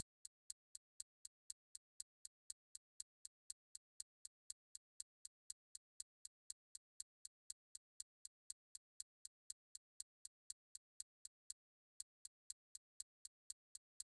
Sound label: Clock FX; Goosebumps